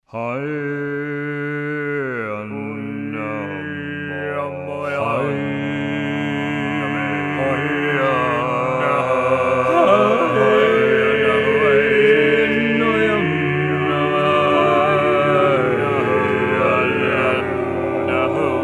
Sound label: void chant